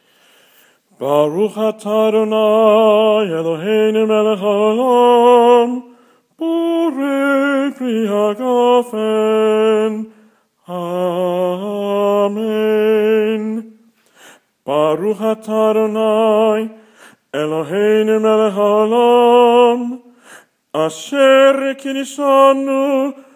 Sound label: kiddush